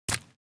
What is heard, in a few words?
Footsteps on mud shoes single step